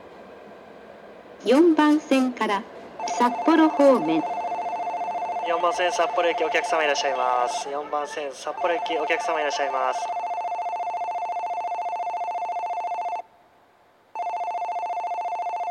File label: bell; asahikawa; jr-hokkaido